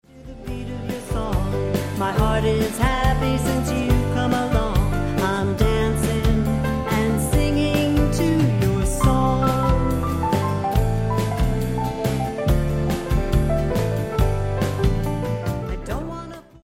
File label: DANCING AND SINGING